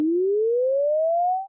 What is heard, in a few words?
tone up short